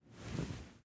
armor; smite; anhur skin13

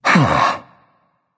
sigh; smite; susano

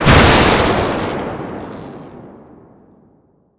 hit; smite; god raijin

